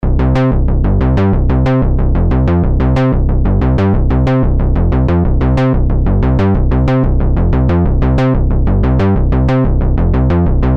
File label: Juno Bass